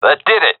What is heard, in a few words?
cheer, seal diver; Source Model Packs; Counter Strike Model Pack; CS2